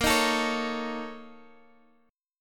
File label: A sharp Minor Major 7th Double Flat 5th, down, Guitar, Half Step Down